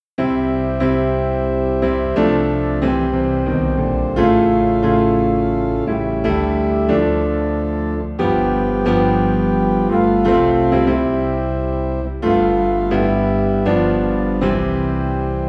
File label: Piano and Organ Low in C